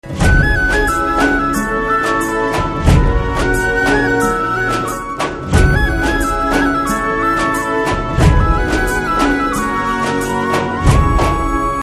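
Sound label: background music Telugu Ringtone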